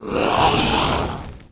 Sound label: elemental damage, monster